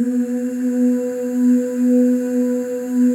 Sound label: OO, FEM OOS, Voices; Symphony of Voices; Spectrasonics; FEM.2 OOS